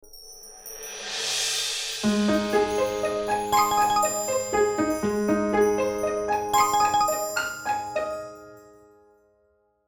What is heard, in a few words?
Smartphone ringtone modern call